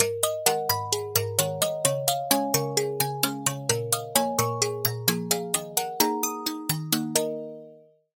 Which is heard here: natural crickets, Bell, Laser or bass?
Bell